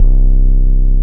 BASS, Drum & Bass, 130 BPM; Ueberschall; Drum & Bass Resonance

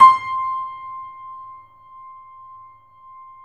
The Piano, sustain; YAMA SUSTAIN; InVision Interactive

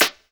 SD, Dance Mega Drums; Best Service

